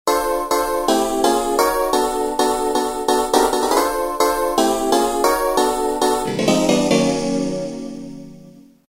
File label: Choralvibe; Roland M-DC1